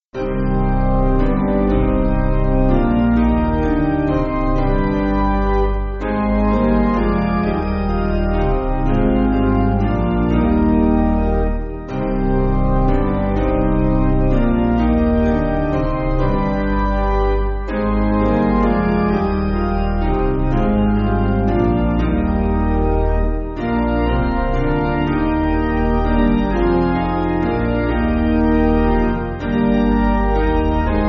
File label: Piano, Backing Files for Churches; Eb